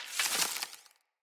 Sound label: Bow Equip End; Weapons; Player; Bows; Fey Thorn Bow